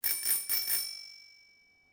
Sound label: bike; hongkong; ambience; Soldier Of Fortune II Double Helix; XBOX